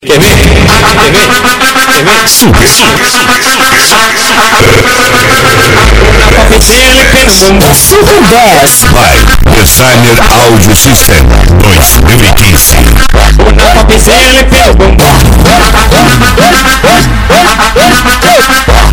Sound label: super bass; designer audio system